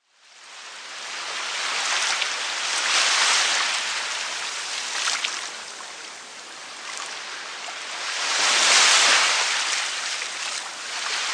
Beach, Soft crystal clear water rolling onto a white sandy be; Rarotonga Beach: Soft crystal clear water rolling onto a white sandy beach.; Environmental Sound Effects